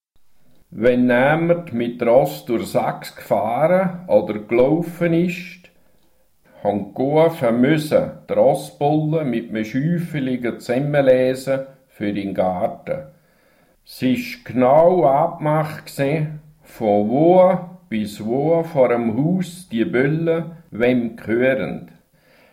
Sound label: sax